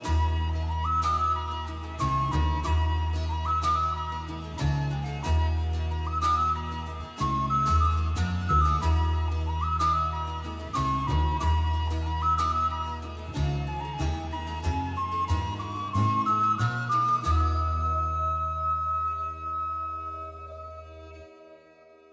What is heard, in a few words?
Low Key Positive Track; Crusader Kings III